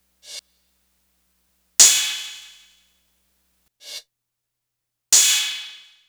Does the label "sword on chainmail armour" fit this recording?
No